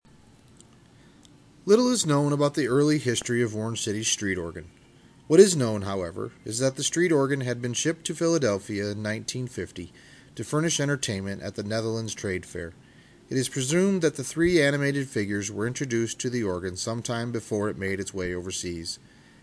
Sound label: dutch street organ